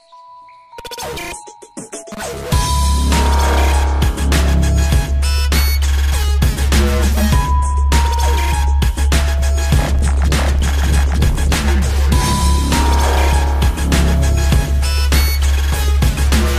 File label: new electronica, ringtones